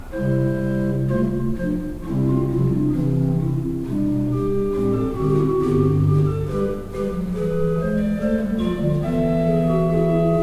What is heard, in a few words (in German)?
Euler II P, Orgel